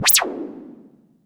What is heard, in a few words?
noise fx; Masterbits; Switch FX